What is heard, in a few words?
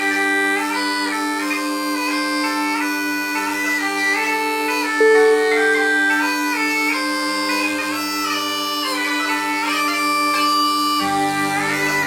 highland scottish bagpipes